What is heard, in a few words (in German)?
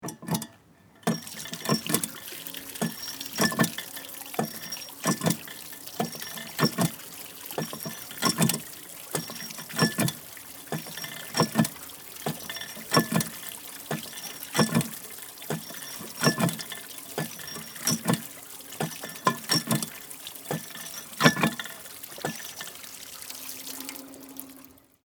Spielplatz Wasserpumpe